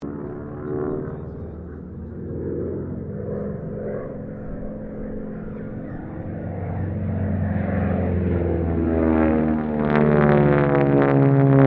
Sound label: AIRCRAFT AT-6D HARVARD MK IIB ROLL, Training aircraft; Airplanes; Vehicles; 1942